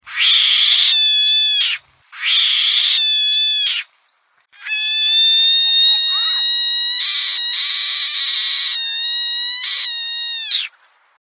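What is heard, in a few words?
DESERT RAIN FROG SOUND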